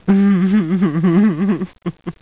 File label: ha ha